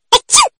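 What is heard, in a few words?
sneeze notification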